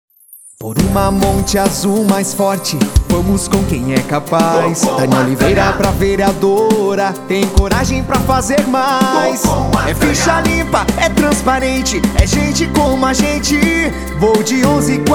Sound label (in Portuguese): Jingle VEREADORA; Monte Azul